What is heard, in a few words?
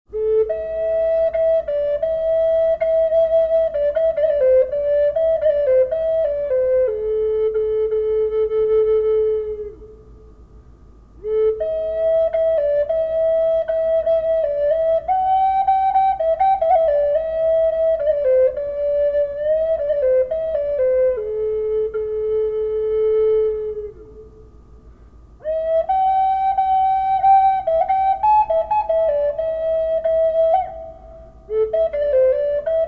german shepherd reverb, flutes; collector flutes